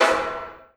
MP SD2

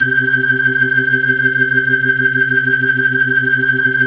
C3F, B3

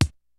KICK SNARE; Kid Nepro; Elite; KICKS SNARES

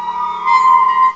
EEERRRY; CMI Sound Library Rev 1.3

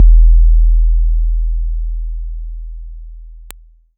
Bom, Kik, One Shots; Sonic Foundry (Sony Creative Software) - Future Retro Dance Excursions

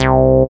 SYN TB303, Roland; Sound & Vision - Gigapack I CD 2

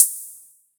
Quasimidi 309, Drums

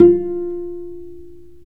STR VC PIZ, Chamber Pizz, String Master Series

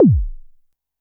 korg trident

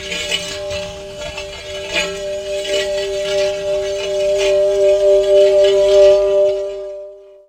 Metal Scrape; Zero-G Jungle Warfare 1, 2 & 3; 90 s Sample CDs

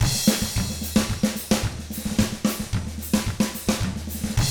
110 SMBA samba; ILIO; Double Platinum Drums 1

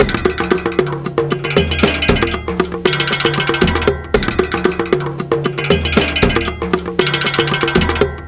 drm 116, Breakbeat, 116bpm; Rodent Power

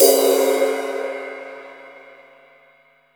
crash L; Zero-G; Chemical Beats; CRASH ZOO

